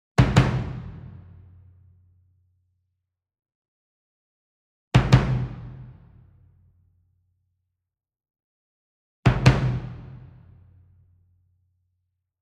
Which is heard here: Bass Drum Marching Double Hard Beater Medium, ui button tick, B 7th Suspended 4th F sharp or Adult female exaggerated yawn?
Bass Drum Marching Double Hard Beater Medium